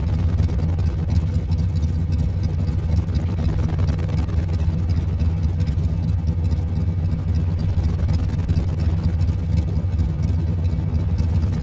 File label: jetski; giants